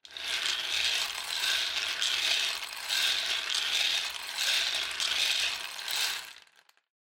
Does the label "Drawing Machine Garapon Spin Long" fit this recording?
Yes